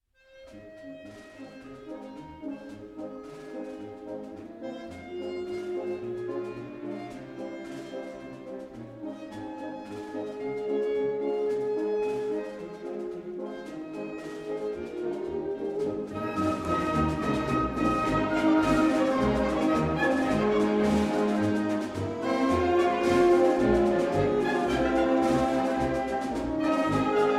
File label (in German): Marsch